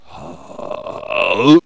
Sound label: daemon die; megaglest; megapack; norsemen; battleaxe berzerk